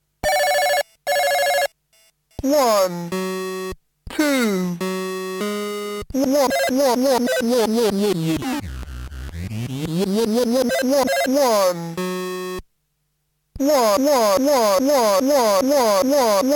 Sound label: Stutterbox sound sample; Oneoffs